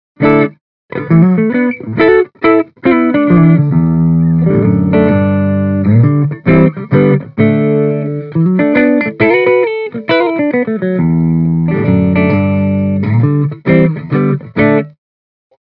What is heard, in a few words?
Mic Inside G12H 65 Clean Les Paul; Mini Rox